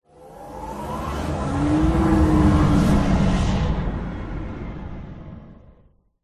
cruiser flyby animation particle swish swoosh accent logo sci fi futuristic; alliance; alien ufo; epic stock media